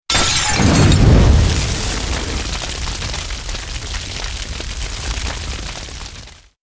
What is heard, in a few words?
Hotline Alert